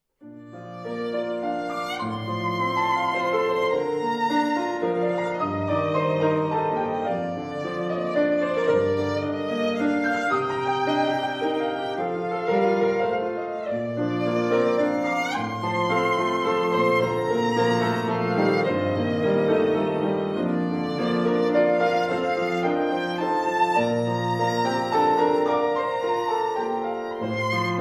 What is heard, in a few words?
Piano Violin